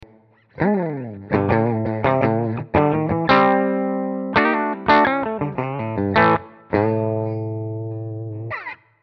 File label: Clean